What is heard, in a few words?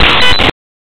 bits